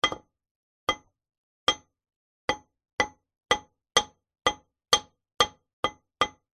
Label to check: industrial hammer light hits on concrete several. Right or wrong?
Right